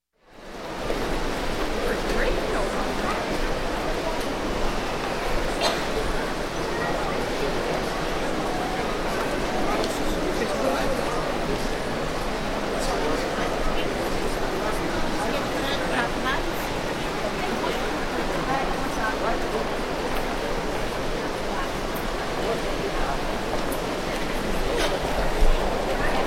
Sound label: Shopping Mall Large, Indoor General Ambience; Store; Sound Ideas 2000 Series